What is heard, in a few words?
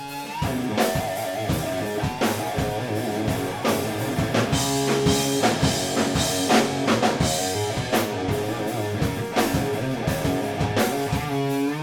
Riff gtr drums